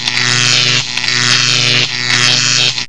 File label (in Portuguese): choque; 7invasores